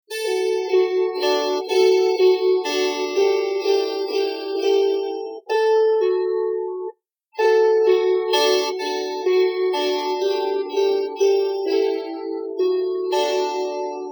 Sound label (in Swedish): melodik, Kantele